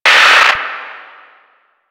Screen Flicker; Warframe; Duviri; Easter Eggs

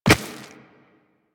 Walk Rock, footsteps; Warframe; Operator

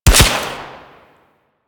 Goo Gun Shot

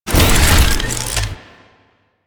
Turret Slow Deploy; Warframe; Zariman

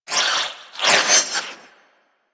Scoop Idle Spin; Missions; Tenno Ball

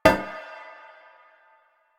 Stone Cafe Table Impact; Warframe; Nineteen Ninety Nine

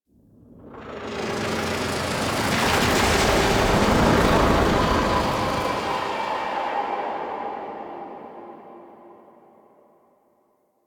Pulsating Whoosh; Ambience

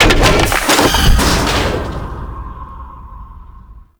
Loot Crate Open A; Tau; Tau Circles Of Hell; Loot Crates

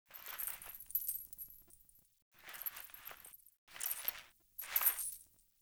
Foley; Friendly; Verminia; Bromius Quest

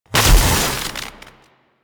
Gun Shot; Warframe; Thano Tech Arch Gun

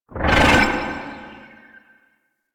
Cast; Warframe; Animus; Anima Animus

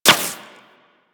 Laser Impact Default; Warframe; Weapons

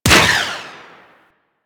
Space Turret Shot; Warframe; Ambience; Space Battles

